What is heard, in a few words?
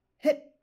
Jump Build Up